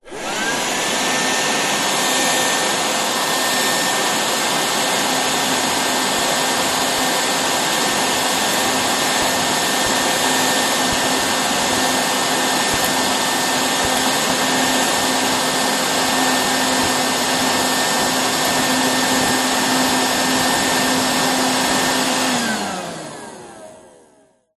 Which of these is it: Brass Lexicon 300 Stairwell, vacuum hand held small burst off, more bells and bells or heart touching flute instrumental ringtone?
vacuum hand held small burst off